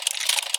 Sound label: Money Count